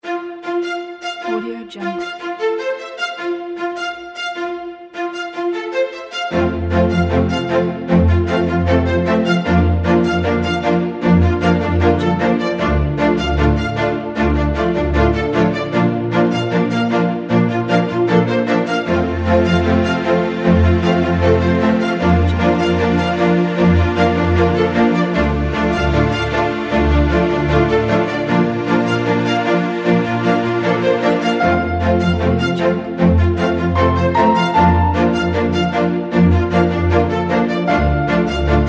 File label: motivational classic